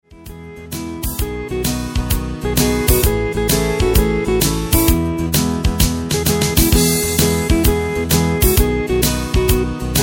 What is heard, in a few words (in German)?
Drums